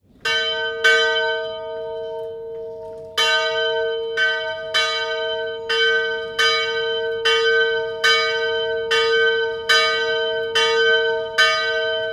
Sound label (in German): Dorfglocke